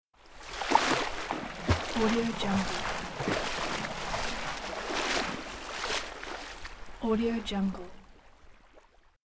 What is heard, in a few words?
walking in the sea